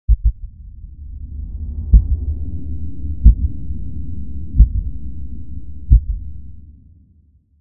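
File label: sound effect deep underwater, heartbeat pulse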